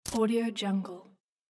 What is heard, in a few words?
smack button